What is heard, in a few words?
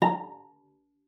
cello pizz A#5; 90 s Sample CDs; Xsample VOL-5